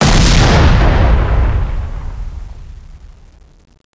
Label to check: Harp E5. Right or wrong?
Wrong